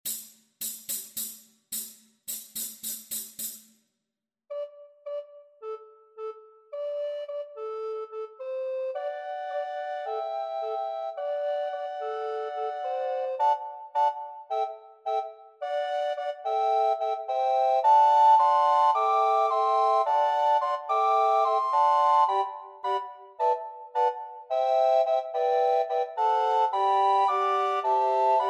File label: Tempo 108, recorders; woodwind